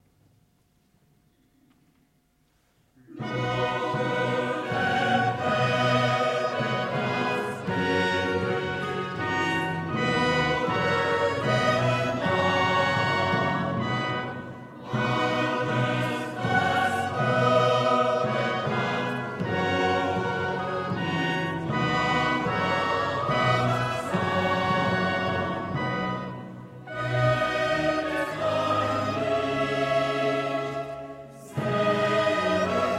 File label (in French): Cantate Choral; BWV 137; Lobe den Herren; Arpege; Parlement